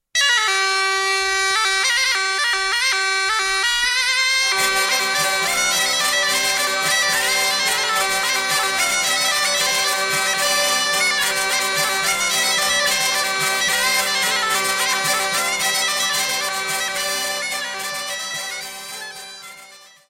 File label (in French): Bourree du Puy-de-Dome - France